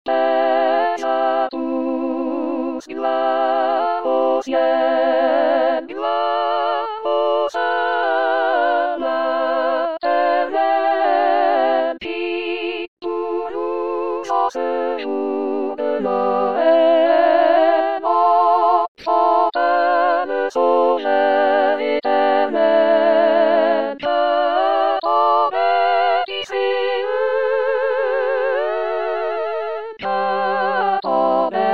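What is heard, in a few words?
soprano 2; Douce nuit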